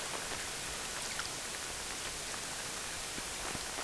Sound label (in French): Bruit, corse